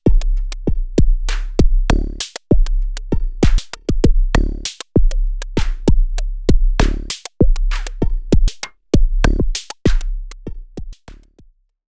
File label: Behringer Space FX Korg Volca Drum LFO Filter